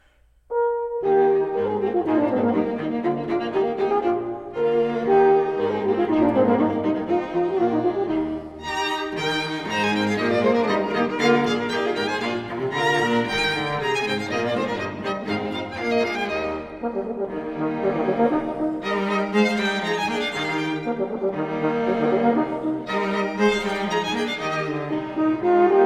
Quintet For Violin, Two Violas, Violoncello and Horn in E-flat Major; Allegro; Chamber Works For Winds And Strings